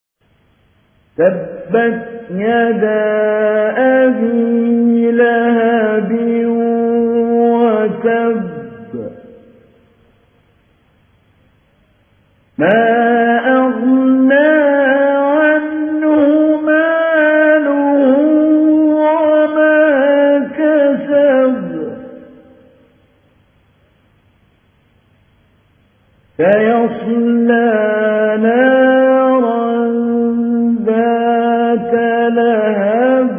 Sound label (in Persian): قرائت مجود; محمود علی البنا; سوره مسد